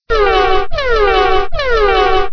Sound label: error, notifications